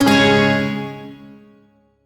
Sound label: Shawzin Chromatic Chord; B; Warframe; Duviri